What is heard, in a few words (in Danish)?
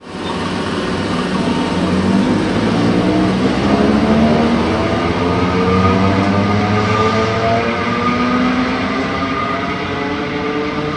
S-tog 2g afgang; S-tog (2. gen) Afgang; Andre Baxter